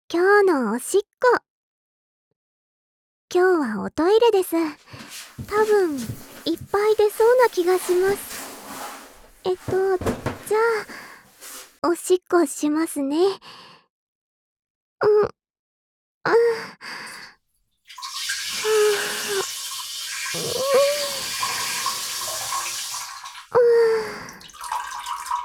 今日のおしっこ 普通にトイレで

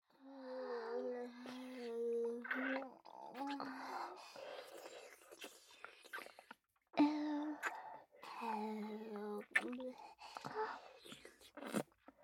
ダブルフェラループ

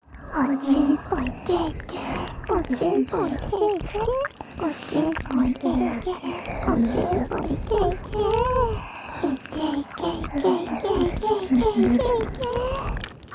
射精しちゃえ～～♪＋れろれろ両耳舐め; おまけループ（オナサポ）; メスガキるびぃに馬鹿にされたい！

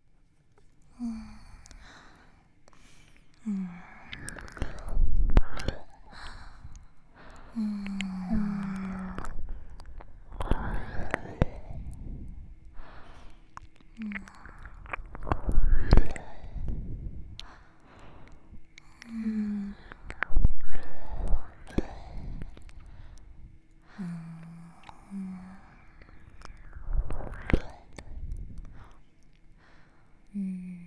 んべぇ（両耳）

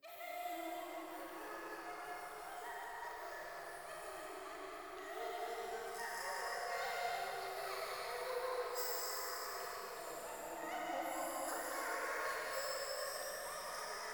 喘ぎ 川のせせらぎ

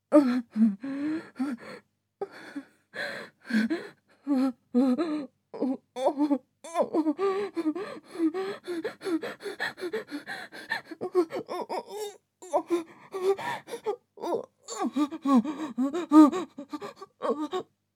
オホ声; SEなし

